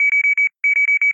pda sos; STALKER; IWP

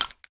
shell 12ga dirt; STALKER; IWP

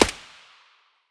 aug silencer distant; STALKER; IWP

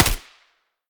sa58 silencer mono distant

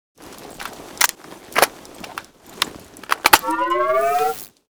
gauss reload empty

